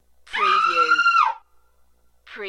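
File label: Female Scream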